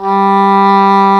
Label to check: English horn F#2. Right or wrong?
Right